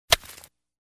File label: hoe